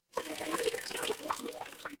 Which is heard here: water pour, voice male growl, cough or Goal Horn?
water pour